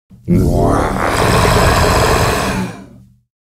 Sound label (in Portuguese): efeito sonoro zumbi faminto